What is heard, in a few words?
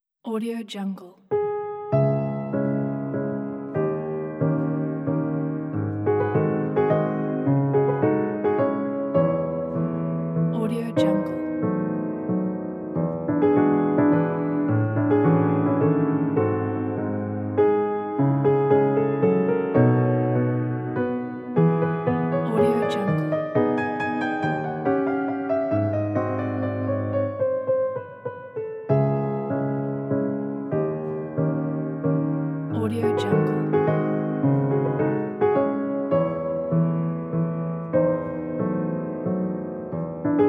waltz; stannau